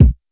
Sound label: kick; Classic Hip Hop Mega Kit